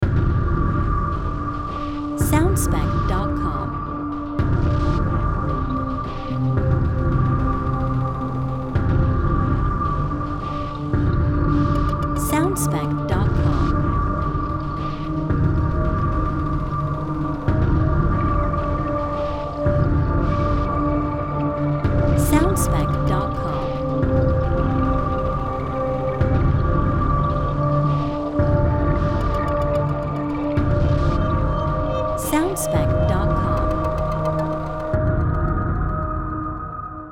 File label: Dark Pulse Bed Variation A